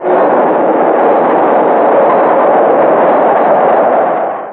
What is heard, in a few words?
Ancient Noise FX, Industrial